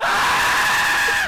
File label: Star Scream; wow-Heimdall